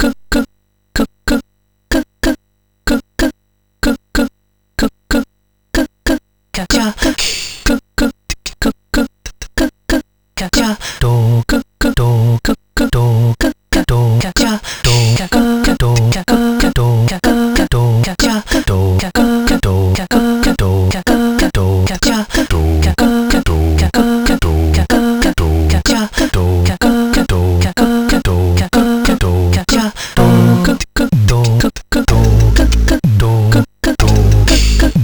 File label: Caves, MOS6581R4